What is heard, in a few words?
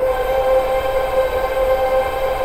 VOCAL WHISP; Pro-Rec - Synthsonic